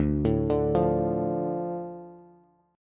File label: D Minor D Bass; Dm arpeggiated; JGuitar